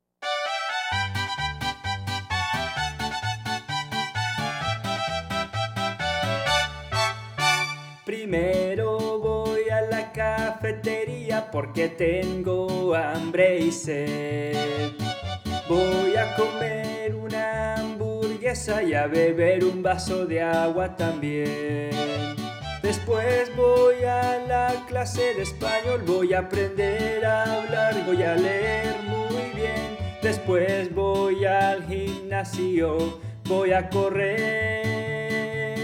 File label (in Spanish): Que Hago Hoy (Vocals)